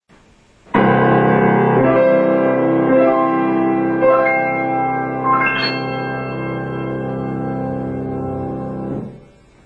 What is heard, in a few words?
yamaha comp; ピアノ; ヤマハ